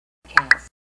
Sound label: chirp click